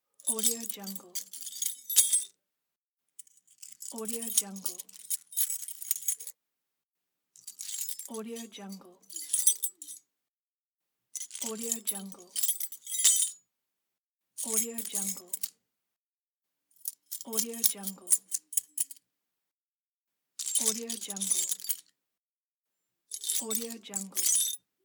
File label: keys rattle